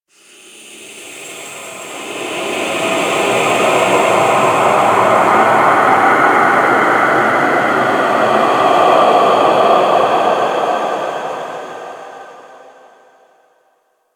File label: Whisper B; Ambience; Interior; Warframe; Orokin